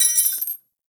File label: Belt Fed Rifle Links D; Warframe; Tenno; Frumentarius